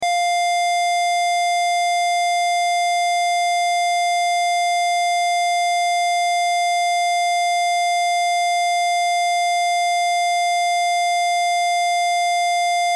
Test Tone Square; Wave 700Hz 60 Secs -18Db Loop

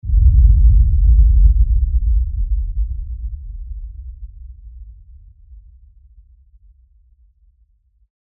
LFE Explosion, Shockwave, Deep; Production Element, Imaging Element, Accent, Transition; Deep Tone; BlastWave

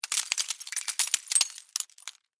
Impact Glass Debris; Materials

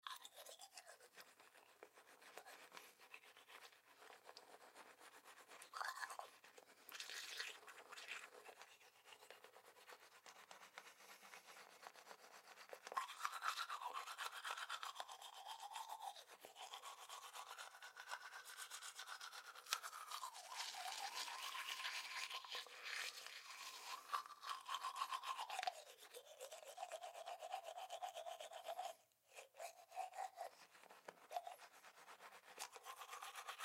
Brush Teeth Long Various Speeds; Brushing; Human; Blastwave

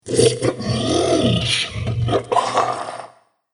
Ancient Mummy Monster

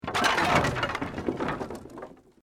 Wood Collapse

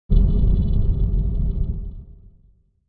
Flame; Flame sound for video editing, movements, logos, flash, or animation.; Sound Design

